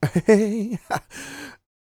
LAUGH, Vocal Jazz Fx

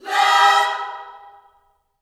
LOVE CHORD, Vocal Gospel; Spectrasonics; Vocal Planet Vol.1 Gospel; akai

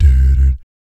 "BS D D", vocal jazz fx

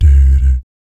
"BS D D", vocal jazz fx

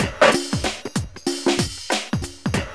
LOOP, DRUM; E-Lab; X Static Goldmine 2

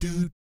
DOOT F3, Vocal Jazz Fx; Spectrasonics; Vocal Planet Vol.3 Jazz Fx

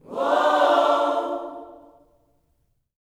WHOA OHS, Gospel, Vocal

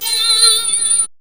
SYN-FX

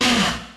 IND

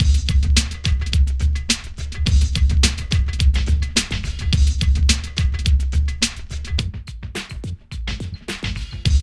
PHAT LOOP; E-Lab; X Static Goldmine 2; akai

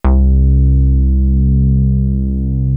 MOOG BAS

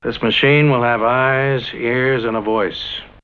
VOC

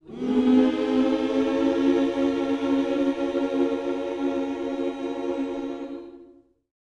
MM MINOR, Vocal Gospel; Spectrasonics; Vocal Planet Vol.1 Gospel

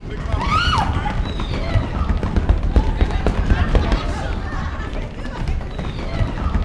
UY STAMPEDE, Vocal Planet Gospel, JUNGLE; UYC JUNGLE

